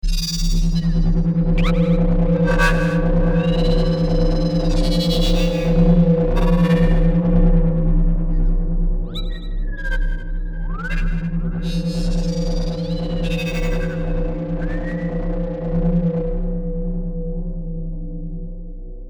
Alien Talk

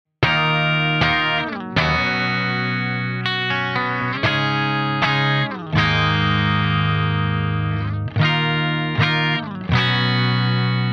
Riff clean processed

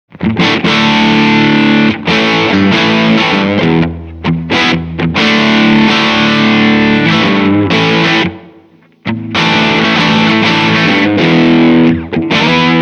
Blackstar AMPED1 UK EL34

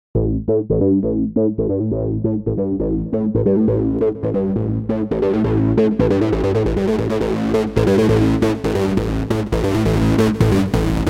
Feedback; Behringer MS-5

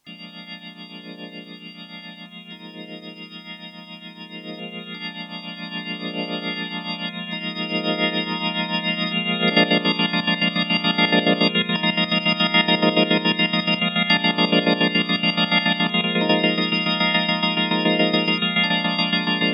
Hammond XK 4 Tremolo; MFX1

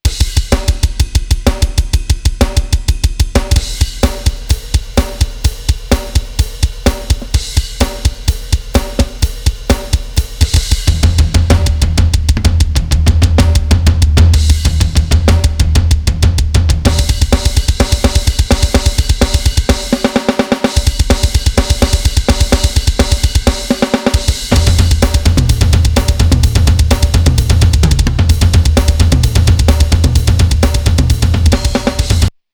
Double Jammer Metal div. Grooves; Harley Benton Dual Effect

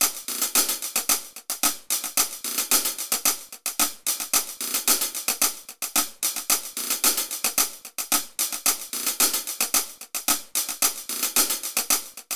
XLN Audio Life March

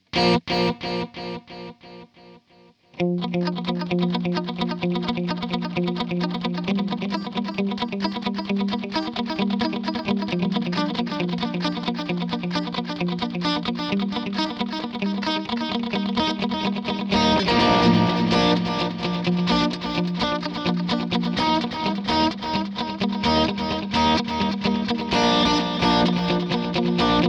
Ambient Echo; MXR Joshua

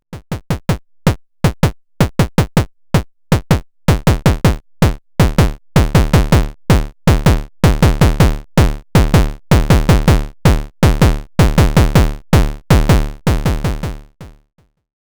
Metal Fetishist Kick VCA Decay, body synths

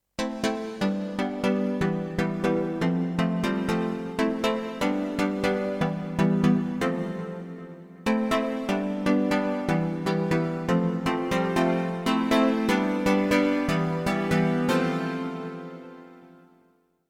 Synthwave; Iridium Core Wheel

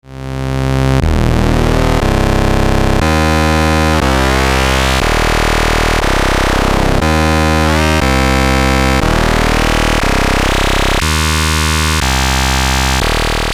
sync fm saw

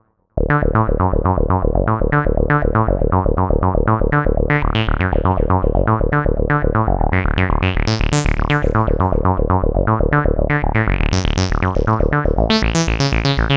Oberheim OB 1 Techno Sequence; GForce Software

